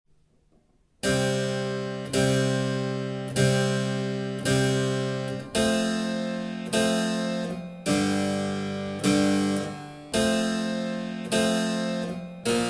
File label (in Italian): CLAVICEMBALO Pavana accordi senza arpicordo; Pavana in passo e mezzo: solo accordi, senza arpicordo